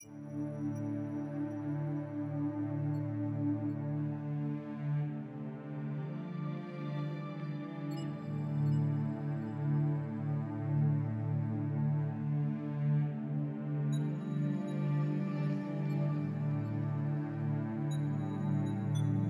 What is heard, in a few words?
Music Greenland ambient